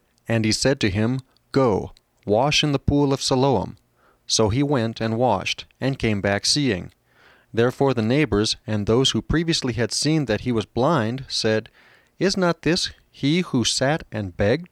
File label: music english